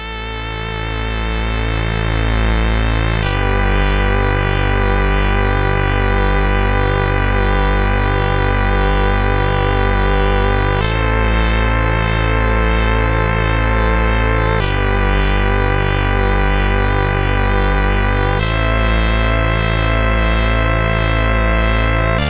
tune, CSG8580R5; Cartilage

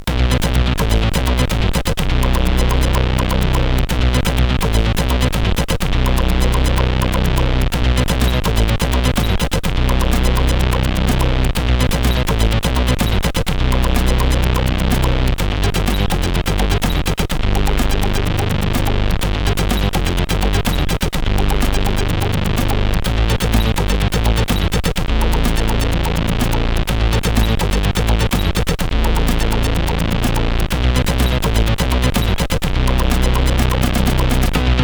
Bass Destruction, sid CSG8580R5

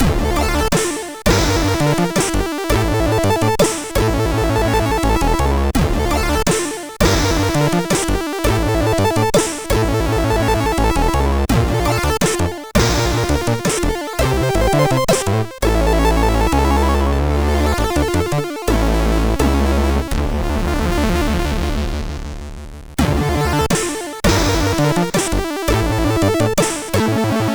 MOS6581; MOS6581R3

